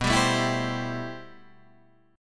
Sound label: A-Minor 7th B; Am7/B strummed; JGuitar